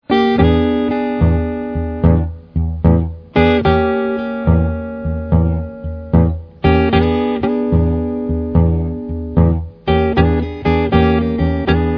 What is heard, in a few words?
blues guitar